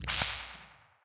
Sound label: Click up